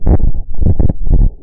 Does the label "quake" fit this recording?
Yes